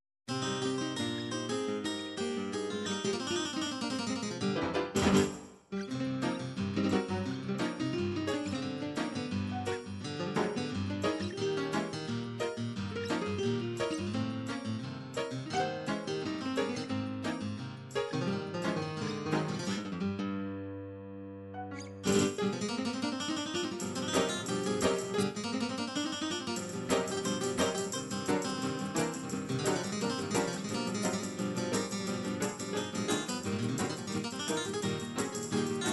aguitar, midi

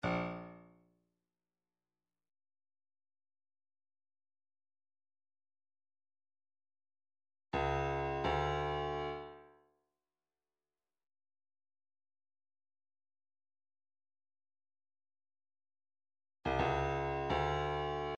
130 toms, midi

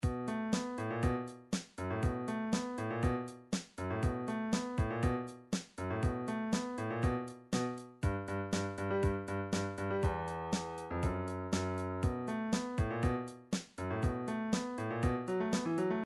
funk, midi

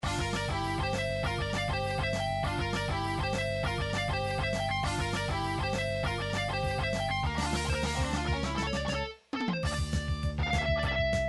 title screen metal remix; nintendo double dragon

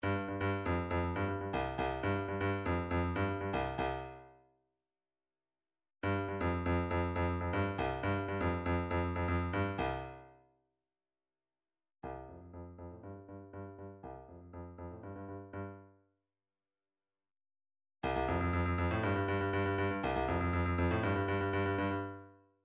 drums

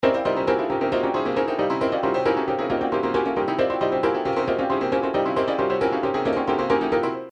trance midi loops riffs; newtronic trance constuction kit

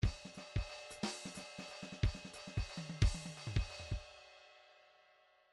midi file fills 3 4 fill

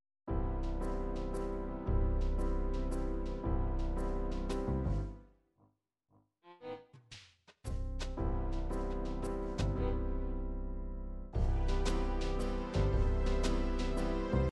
swing waltz, midi

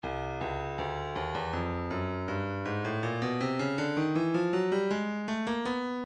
breakbeat 080 bpm; shakey c; Stylus Breakbeat Midi Files

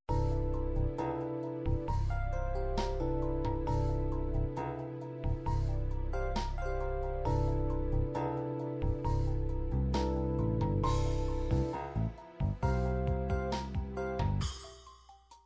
funk fusion balad, midi

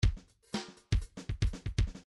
bdf midi file grooves funk misc funk misc

